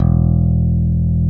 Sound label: ALEMBIC BASS; OMI - Universe of Sounds